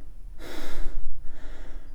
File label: male, Realistic Fatigue Voice; oblivion